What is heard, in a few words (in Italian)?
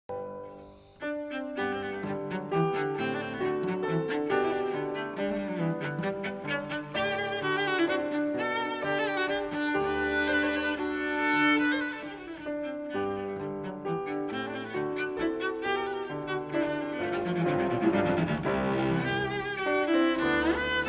Sonata nello stile antico spagnuolo Variation 1 Stesso Tempo; gaspar cassado